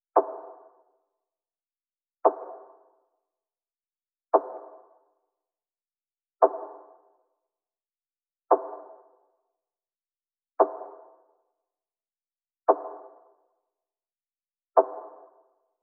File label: 115 BPM C# Min Percussion; Infinity Melody Collection; Cymatics; Take Me Somewhere